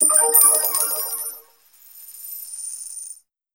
coins; overte

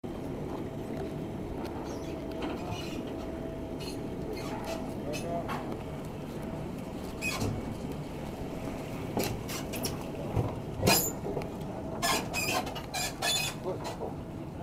boat by a wharf

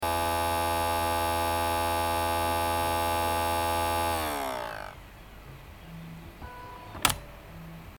computer CD recorder badly fixed end of copy

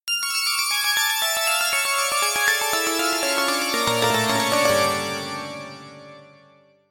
synth piano saw piano

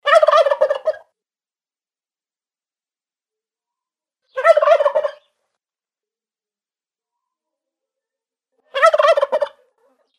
gobbler

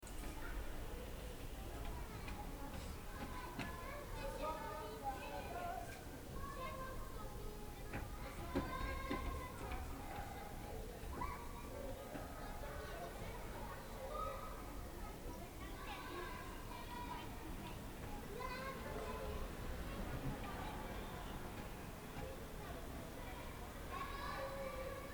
kids next door

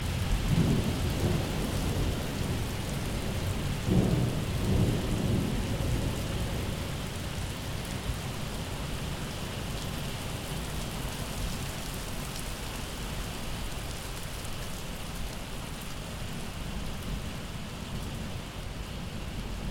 complete summer storm with thunder

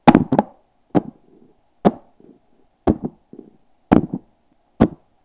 book drop

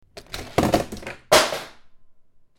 dropping stapler from desk

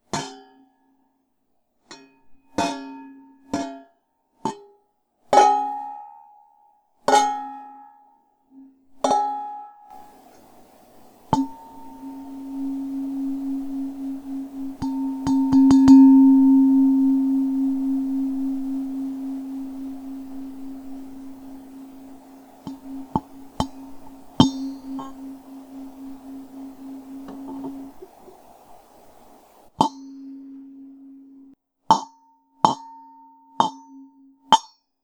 metal pot hits

